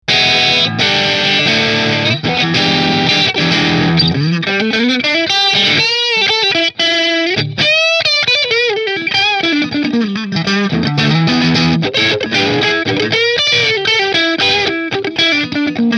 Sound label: Rahan Flame Maple Middle Marshall, guitars